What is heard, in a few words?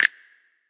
Snap